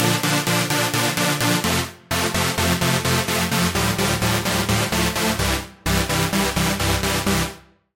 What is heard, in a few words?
Chord, Sylenth1; Chord - Magnetopause; Routed Sound; Sylenth1 Expansion - Star Scavenger Vol.1